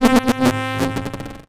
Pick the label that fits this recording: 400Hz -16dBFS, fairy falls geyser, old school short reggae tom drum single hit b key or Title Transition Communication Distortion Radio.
Title Transition Communication Distortion Radio